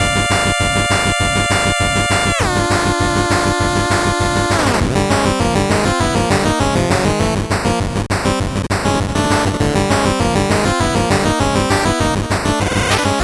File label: 8bit bgm normal